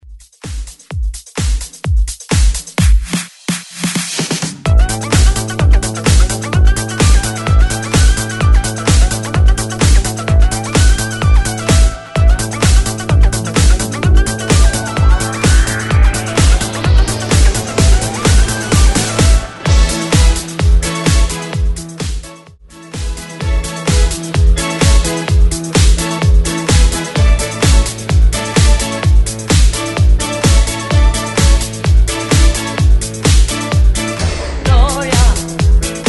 80s reworx

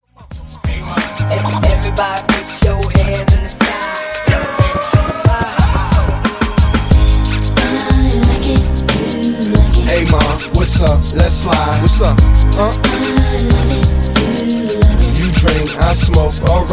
Funk Intro Outro 91Bpm

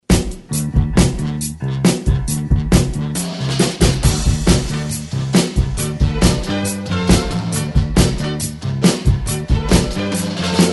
60s ReDrum 137 Bpm; DJDOLLS

